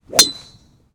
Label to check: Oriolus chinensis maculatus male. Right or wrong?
Wrong